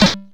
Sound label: trebly snare one shot G key; Duration 0:01; Samplerate 44100 Hz; Channels Mono; snare drum samples